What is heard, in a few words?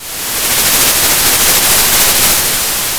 Sound of a thunderstorm